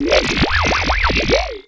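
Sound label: FX right, attacks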